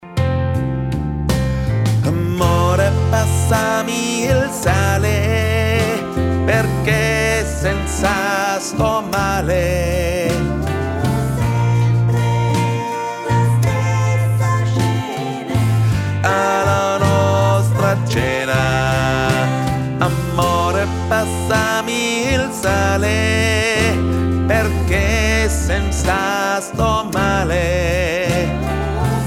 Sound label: Bass